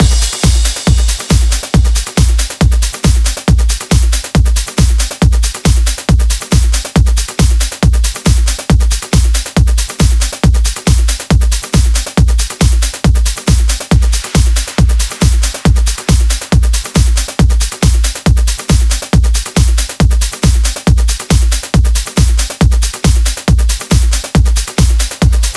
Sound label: Nightfly Kit 138BPM Drum Mix 16 bars; Drums & Perc; Vengeance Samples; D minor